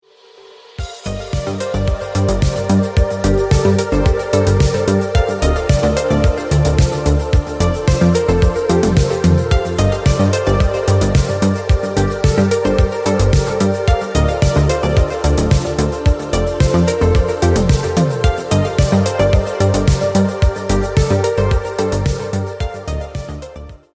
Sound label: travel ringtone, english ringtones